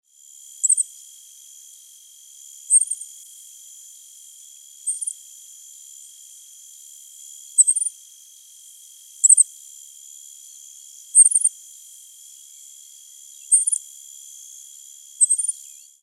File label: Coryphaspiza melanotis